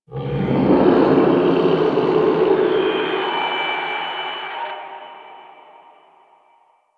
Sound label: Distant Infested Low; Warframe; Ambience; Nineteen Ninety Nine